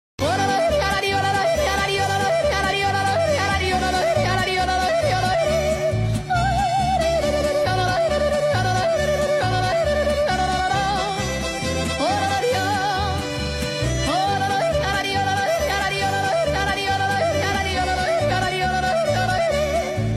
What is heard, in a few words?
Yodeling